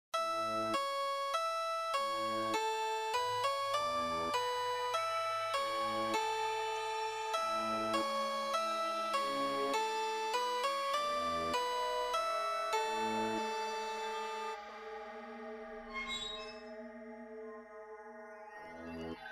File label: music playground